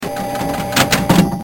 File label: Pinball Reload